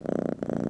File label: purrfect, Catz